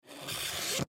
Knife Cut Cardboard, Knife Cut Cardboard Single Stroke; Hand Tools; Foley; Blastwave